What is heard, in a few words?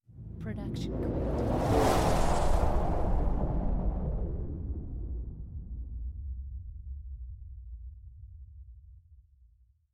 Noise Lifter; soundscrate